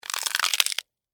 Termite Bite, Animal, Termite, Bite, Hard, Crunch, Wood, Insect, Bug; Ants